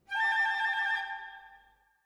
Orchetra, Jazz for piano

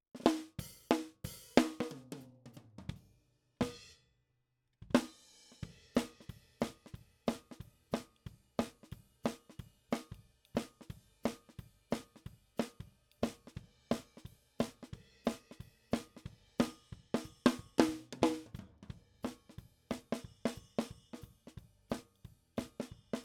SNR top

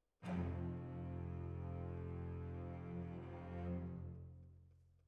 Violins, jazz for piano

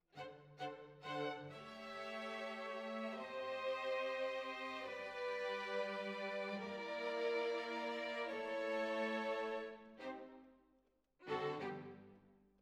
Violins Over

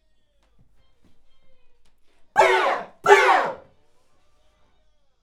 Schoeps AKG, piano

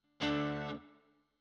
GTR

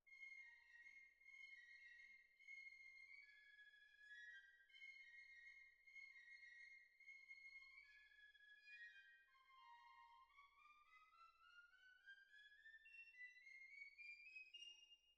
Cbasses

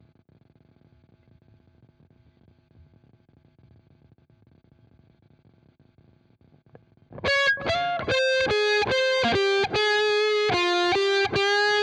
Guitar

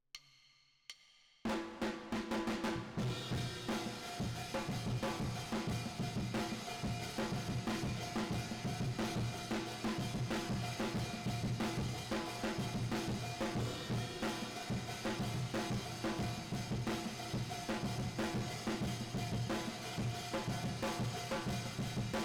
Drums Verb

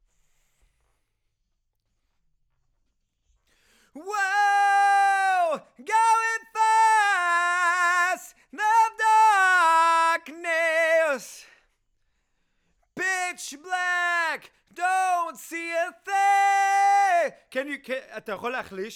Ad Lib

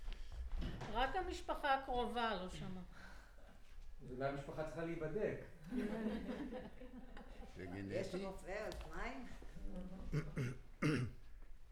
Center u47, Choir

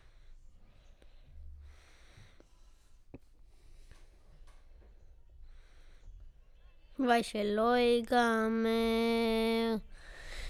VOC

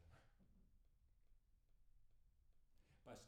PIANO; TANGO

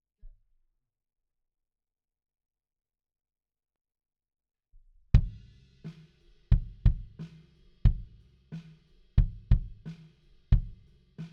BD IN

